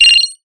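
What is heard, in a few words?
robot position interact, robot parts; Source Engine; Portal 2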